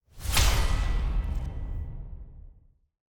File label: Orbital Hammer Player Seen; TANK; 3Souls